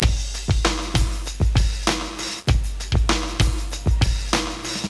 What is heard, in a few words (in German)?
musik